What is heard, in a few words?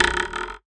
Spoon, Foley; musicradar; cartoon caper